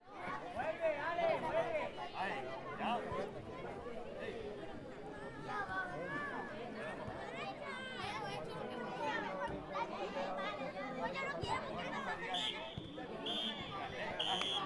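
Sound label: Football Match Partido De Fútbol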